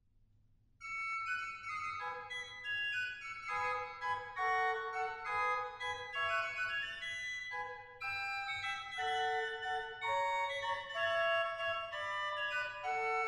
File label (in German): Sesquialter, organ; stade